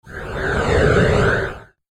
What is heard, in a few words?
airy smooth phase